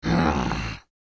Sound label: Mobile game character grunt; video game